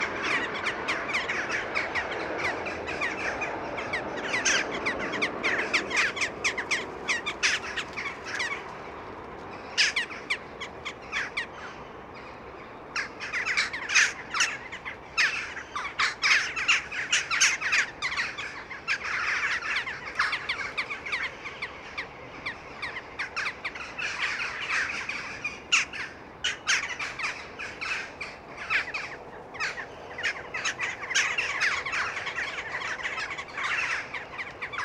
choucas des tours cri

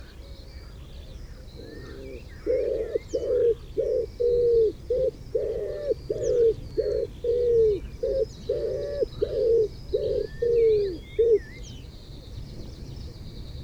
pigeon ramier chant

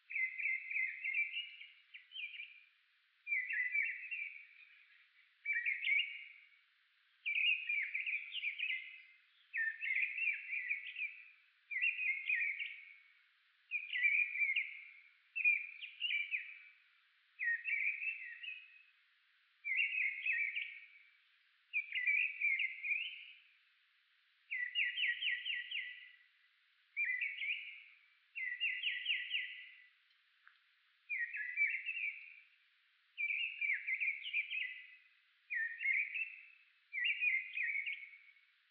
grive draine chant

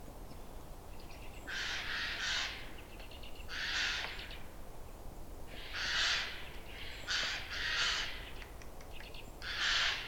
geai des chênes cri

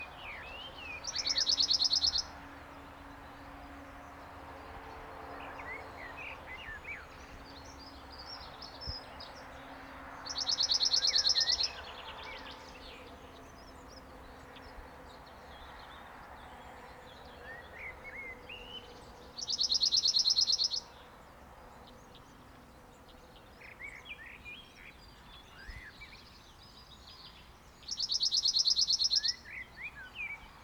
bruant zizi cri chant

